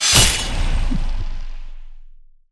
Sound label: Knife Hit